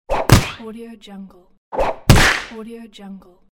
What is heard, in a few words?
cartoon punch